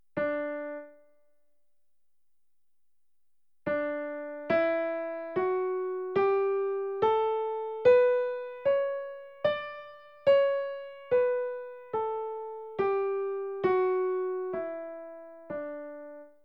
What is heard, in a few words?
Major Scale starting on D